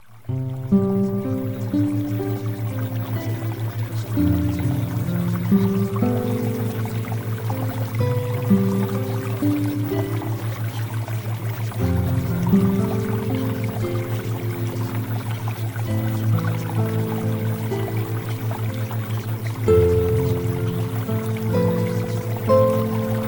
Music Theta Waves

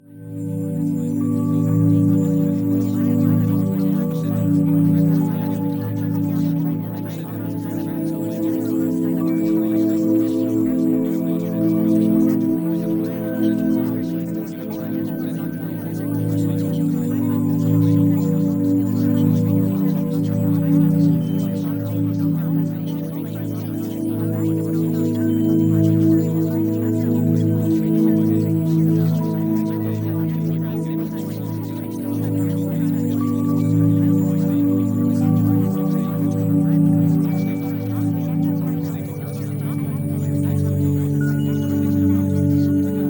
Music; Premium Subliminals

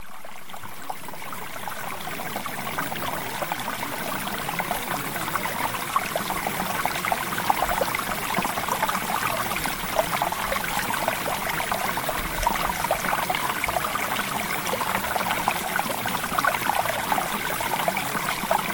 Water; Premium Subliminals; Success With Men

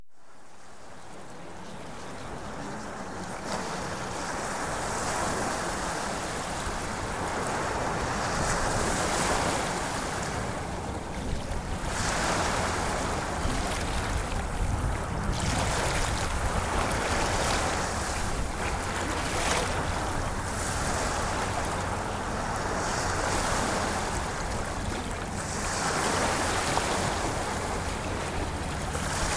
Ocean Waves

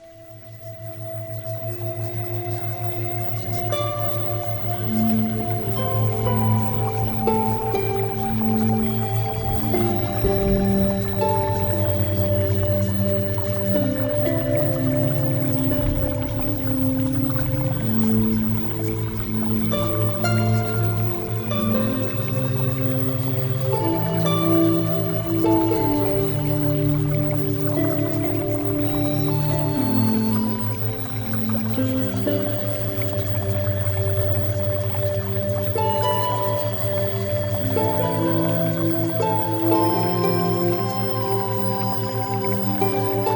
Music Theta Waves

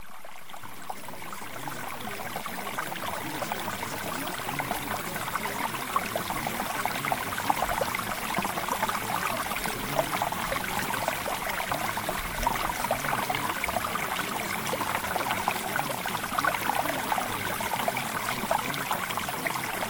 Mountain Stream; Travel Adventure

